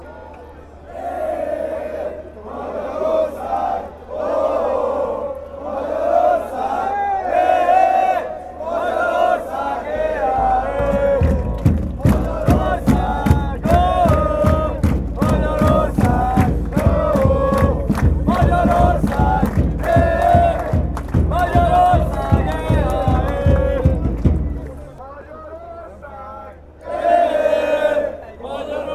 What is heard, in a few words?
hungary chant, fanchants